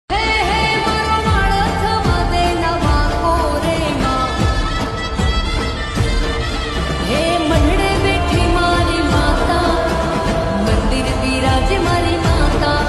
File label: bhakti song ringtone gujarati bhakti song ringtone